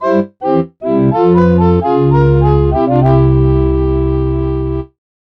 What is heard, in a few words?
Game Over